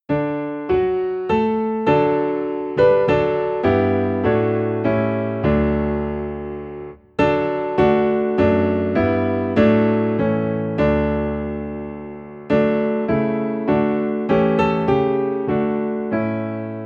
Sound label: Piano Low in D; Come Thou Almighty King